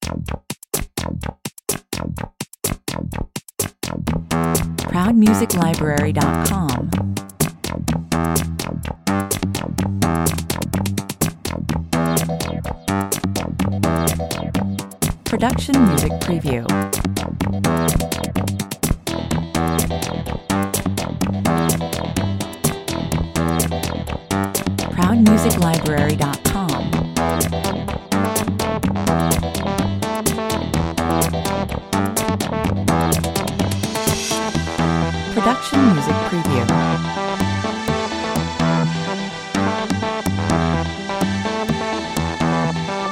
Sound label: spoon poon (bed, no drums) 126 bpm